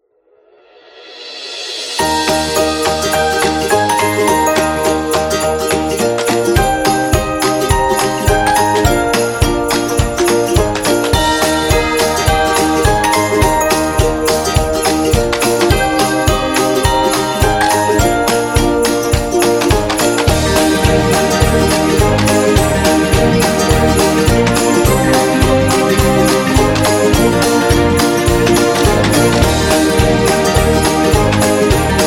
Happy New Year

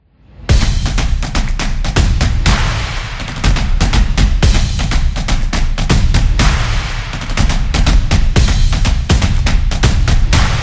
Kitchen Music

